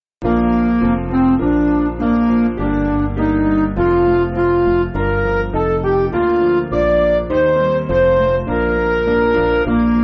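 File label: Music Backing Files for Churches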